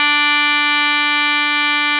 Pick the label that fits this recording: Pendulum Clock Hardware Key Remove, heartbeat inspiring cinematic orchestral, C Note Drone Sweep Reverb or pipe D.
pipe D